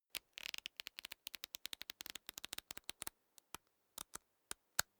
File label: small book riffle through slow